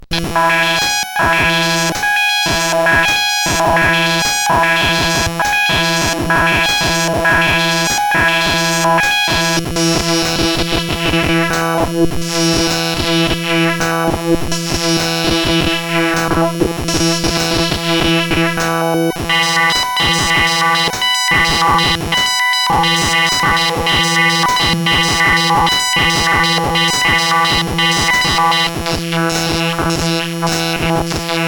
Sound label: Progressive BASIC, sid, CSG8580R5